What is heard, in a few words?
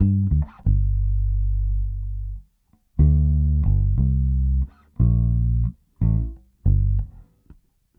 Bass, 70s Analog Funk; Sonic Foundry (Sony Creative Software); What it Is!